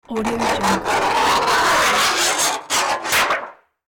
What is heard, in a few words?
single tear of sheet metal; sound ideas